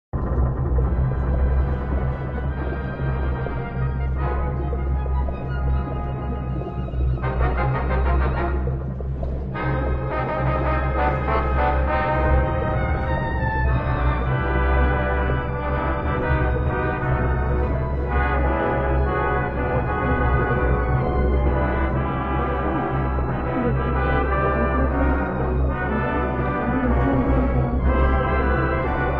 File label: ambience Music; Bioshock